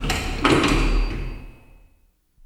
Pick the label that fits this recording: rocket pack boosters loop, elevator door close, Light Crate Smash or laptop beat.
elevator door close